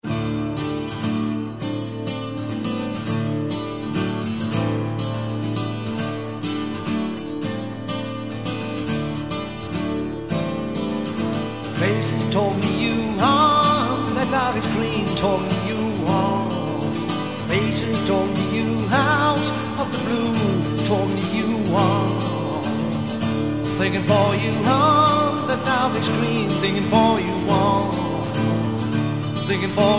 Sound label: acoustic